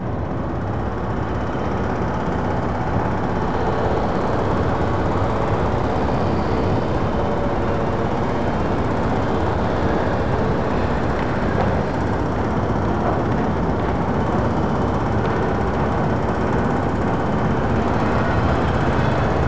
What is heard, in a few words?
city construction, Ambient; Project Gotham Racing